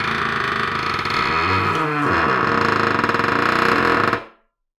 Door Creak

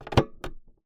Key Noise, Melodica

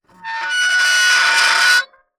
Soldering Iron Stand Drag

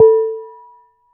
Damped Hit, Glass, Large Wine Glass

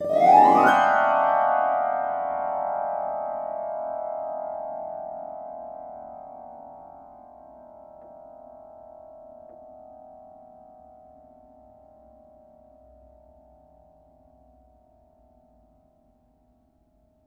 String Brush, piano; Piano Miscellaneous

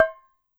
Thonk, Kitchen

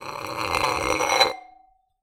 Glass Drag On Floor, Medium Wine Glass

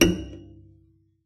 Cello D Bass

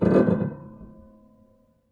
String Rub Erratic, Piano; Piano Miscellaneous

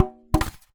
Polystyrene Rattle

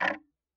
Tick, Clocks Kitchen Clock

